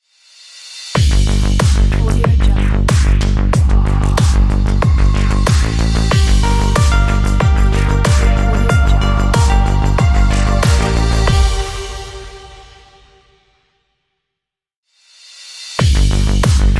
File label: cyberpunk technology logo